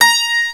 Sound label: GRAND HI, pianos; fairlight cmi